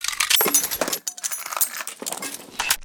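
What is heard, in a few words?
inventory toolkit weapon; STALKER; IWP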